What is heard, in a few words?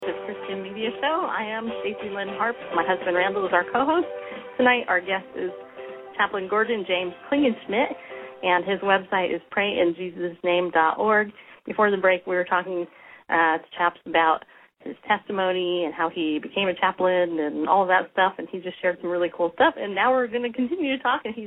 Harp